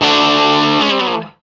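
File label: GTR CRUNCH, Metal; Club-50 - Foundations Roland; Metal Chds; Power Chds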